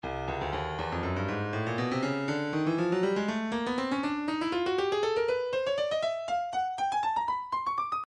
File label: breakbeat midi files 120 121 bpm 120; Tailfeather B; Stylus Breakbeat Midi Files